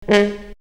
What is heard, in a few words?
Wind; 90 sSampleCDs; Coldcut's Kleptomania VOL1; Brass n Wind